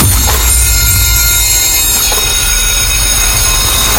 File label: intro, jackpot; wbg games